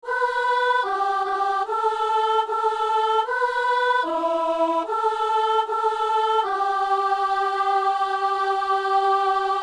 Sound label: soprano; Saint-Denis